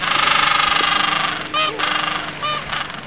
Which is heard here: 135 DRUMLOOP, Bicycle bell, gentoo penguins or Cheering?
gentoo penguins